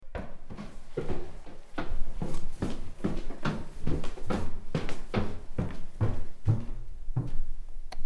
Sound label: Footsteps on stairs